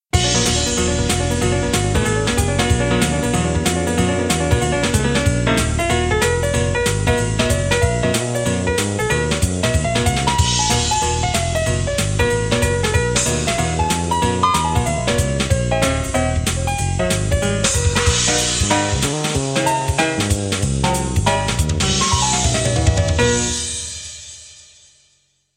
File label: swing